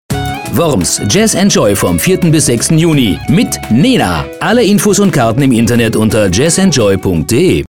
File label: jazz joy reminder